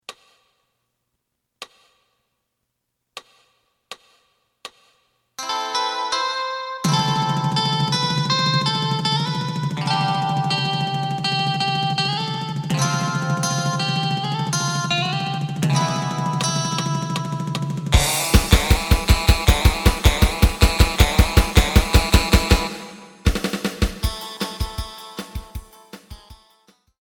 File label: bass; エレキバンド用カラオケCD